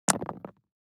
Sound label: DICE on Neoprene, Throw and Roll, Standard, 3 Three Dice; Samples; ArticulatedSounds; DICE Sfx Party (Extended)